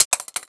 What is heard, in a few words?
mag pistol